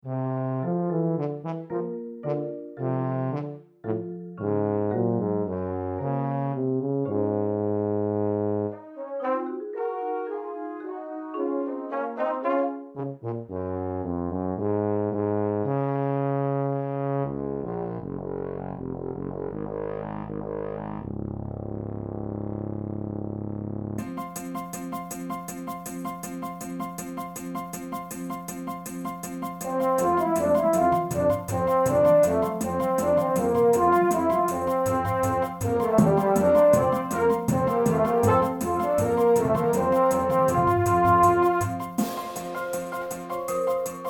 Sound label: The Red Violin Troika